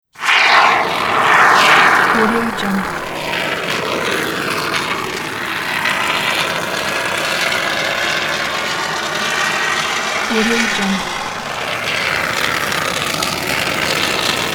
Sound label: spray insulation slow squirt